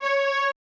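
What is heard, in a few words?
VA Q F#C4-L, viola, orchestra; AKAI Advance Orchestra Upgrade 97 Vol.1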